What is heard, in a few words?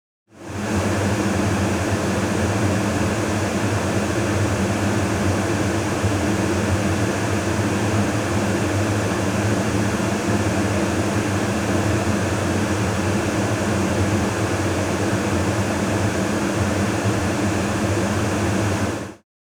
Speech Noise; Wouters et al; NVA